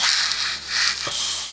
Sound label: moan; The Elder Scrolls III - Morrowind